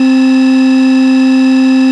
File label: breath